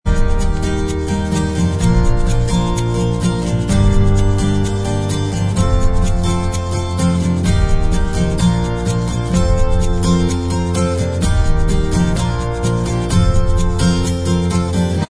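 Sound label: acoustic instrumental track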